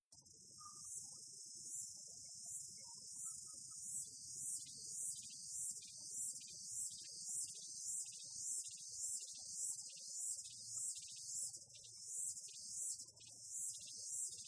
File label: Cicadas; Insects; Sound Effects:Animals; Partners In Rhyme